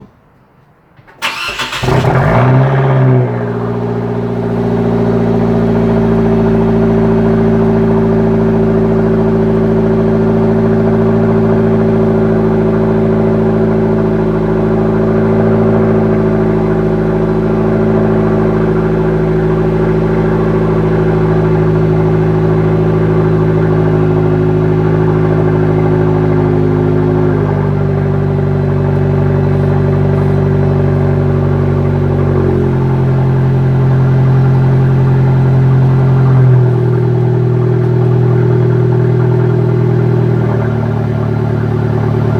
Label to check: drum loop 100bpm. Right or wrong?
Wrong